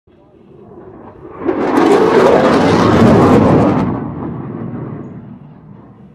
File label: supersonic jet